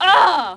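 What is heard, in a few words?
bail vox female; skater; Tony Hawk's Project 8; XBOX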